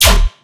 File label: bass hit chugg stab D, Bass Stabs; Virtual Riot